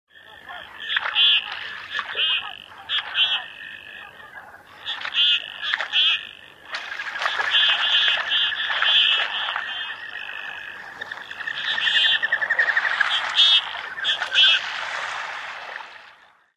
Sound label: common goldeneye call; chesapeakebay